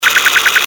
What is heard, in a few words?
good action; CAC ASSETS